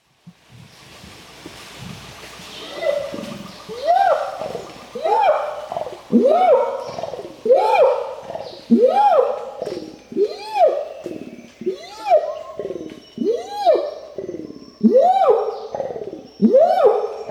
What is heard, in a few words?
Orangutan Sounds